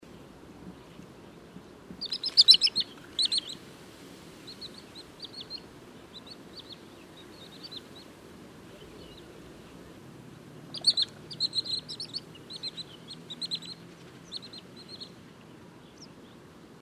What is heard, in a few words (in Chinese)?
鷹斑鷸; 林鷸; 鷸科/冬候鳥．過境鳥